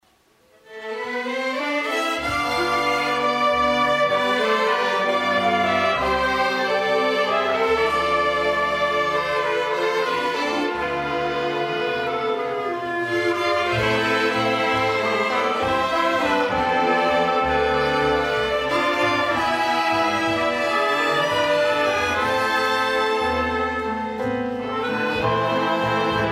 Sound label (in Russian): Оркестр; Церковь ЕХБ Спасение Edgewood WA